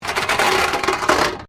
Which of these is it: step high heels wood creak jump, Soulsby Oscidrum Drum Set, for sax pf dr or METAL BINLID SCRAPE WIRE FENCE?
METAL BINLID SCRAPE WIRE FENCE